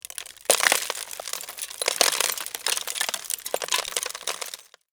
small debris

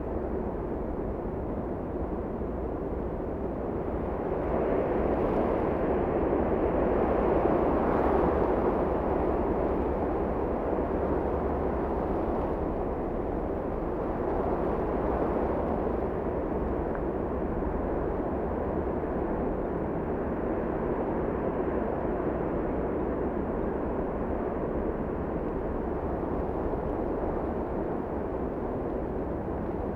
ambience wind

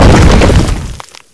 big hit; de hl stronghold

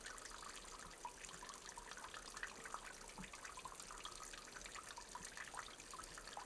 loop water

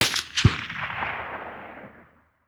soundscape gun far

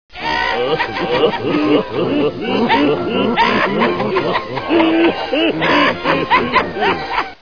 laugh

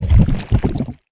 alien chamber deploy

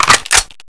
usas slideback

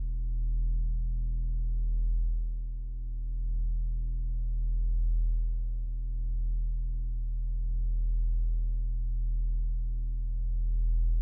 ambience hum

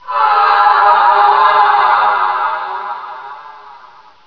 death

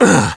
pain

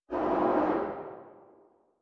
gun far

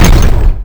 tower moat; de hl stronghold

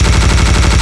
minigun fire

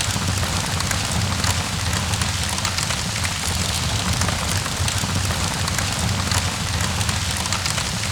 ambience disaster fire

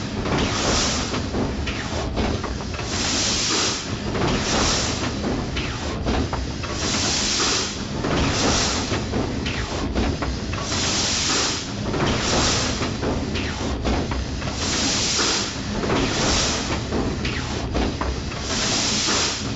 ambience industrial

